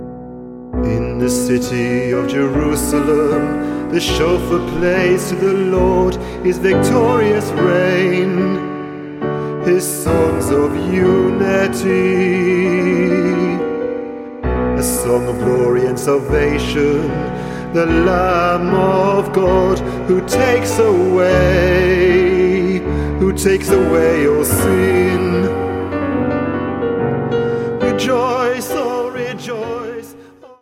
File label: The Shofar Will Sound